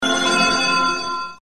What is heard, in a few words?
timeout; catchphrase